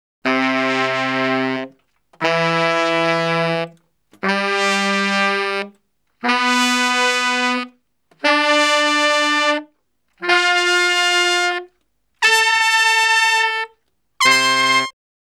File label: Salsa Picante; Masterbits; World Zone VOL-3